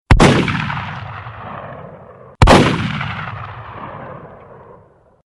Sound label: Gun